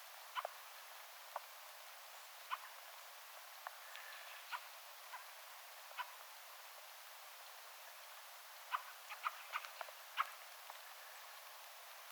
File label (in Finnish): räkättirastaan huomioääntelyä, taustalla toinen ja kolmaskin lintu